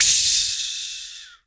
VOC DRUM, MOUTH; MOUTH PC

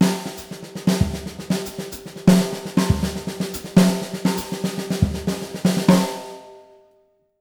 160 BRKBT; Univers Sons; Jazzistic

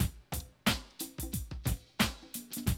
Classic DrumLoops

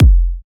kick drive; kicks

